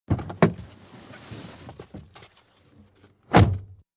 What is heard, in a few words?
Montero Int Get Out, 1998 mitsubishi montero int.